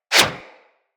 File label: Bullet Parry Metal; Impacts; Bullet Parries